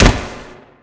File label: marble d; actors; player; bodyfalls; kingpin